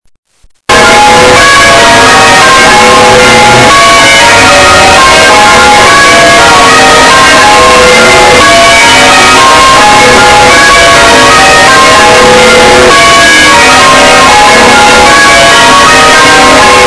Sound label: church bells; brize norton